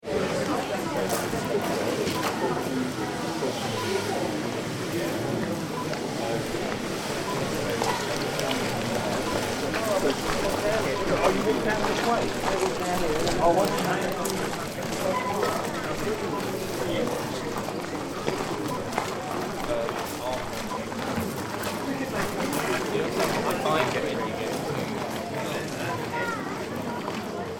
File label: Pub Garden Courtyard